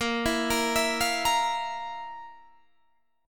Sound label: F Major 7th Suspended 4th